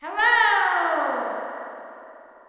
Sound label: Reverb